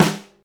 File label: tight steel snare drum sound E key, Royality free acoustic snare sample tuned to the E